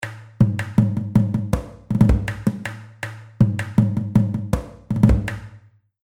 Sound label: War Drums Loop; Musical Sound Effects